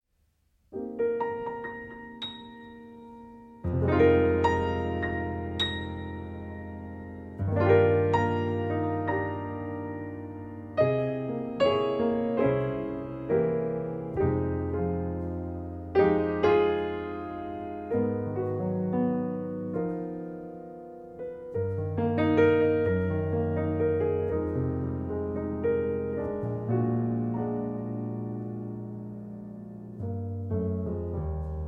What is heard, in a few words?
Arr. for Piano